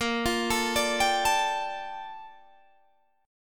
A sharp Major 13th, Guitar Open G

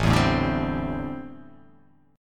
A Minor 11th A, Chord

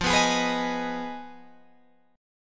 F Minor 7th Flat 5th F down Guitar Open G

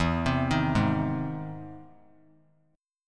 E Augmented Major 7th E down; E+M7 arpeggiated; JGuitar

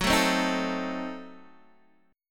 F sharp Diminished 7th F sharp Guitar Open D

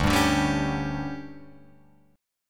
D Major 7th Suspended 4th, Guitar, Open F; DM7sus4; strummed; JGuitar

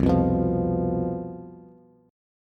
C Major 7th Suspended 4th, down, Bass; strummed

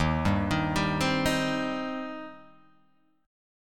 Eb 6th Eb down Guitar Open G

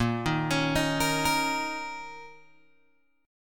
Bb Minor Double Flat 5th Bb, Guitar, Open G

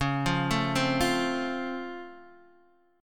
Db Augmented Major 7th Db down Guitar Drop D

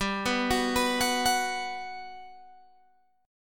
G Major 7th, G, down, Guitar, Open G

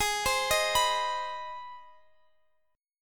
C Minor Major 7th Sharp 5th Ab, chord

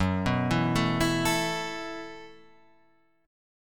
A Suspended 2nd, chord; Asus2/F# arpeggiated

